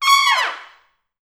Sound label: Pop Brass, C#5; Best Service; ProSamples vol.19; AKAI